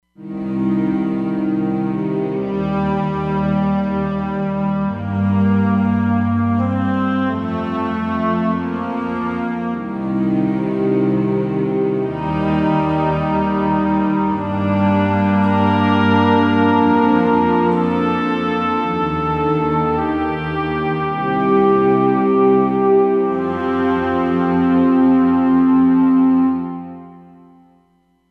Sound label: Wind & Str; Roland Fantom XR